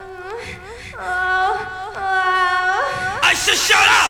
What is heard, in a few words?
SEX; Zero G; Man Machine